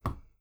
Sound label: tap